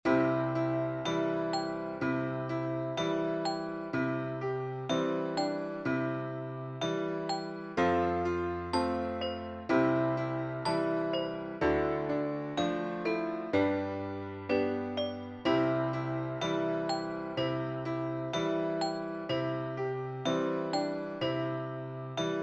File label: Jingle Bells Acomp